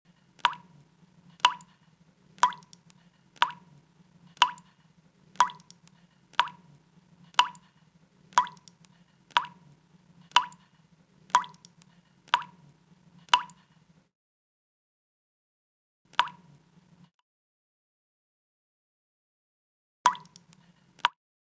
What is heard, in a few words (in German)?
tropfender wasserhahn; haushaltsgerausche bilderbingo